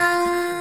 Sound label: Chord F5; 音MAD; 学园孤岛